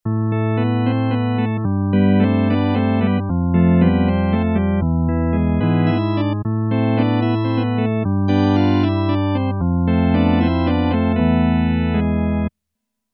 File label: LARGE HALL 2, Boss SX-700; Factory Preset